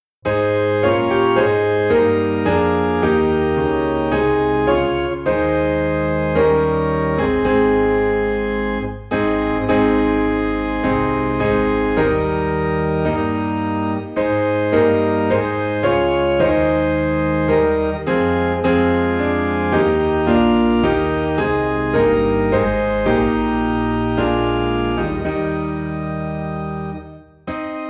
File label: O Come All Ye Faithful, Piano and Organ in A Flat; christmas